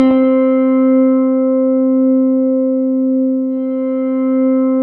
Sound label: C4, SynthLine